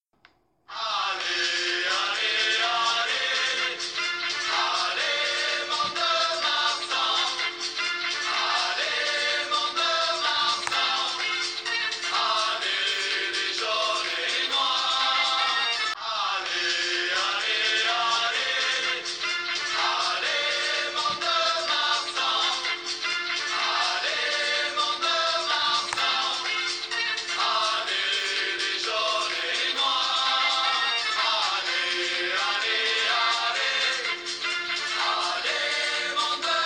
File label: vocal